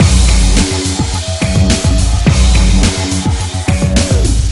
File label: loop